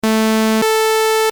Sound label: saw; Sawtooth（のこぎり波）